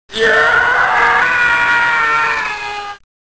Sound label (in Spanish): gritos ufri; deathrun jigsaw; cstrike